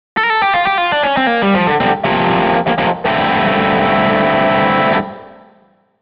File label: ampsim; SONAR 2.0